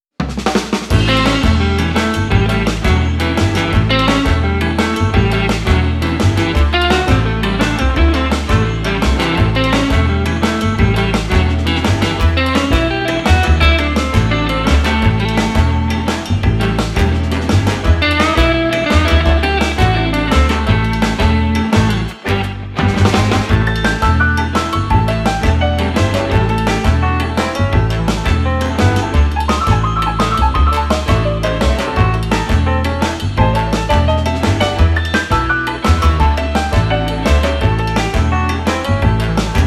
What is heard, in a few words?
cheerful entertainment dual solo